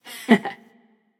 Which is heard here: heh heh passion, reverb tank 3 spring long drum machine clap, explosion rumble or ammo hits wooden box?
heh heh passion